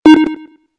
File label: clock